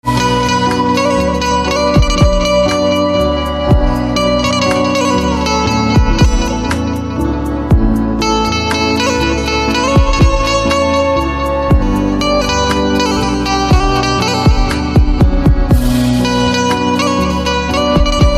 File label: Banjo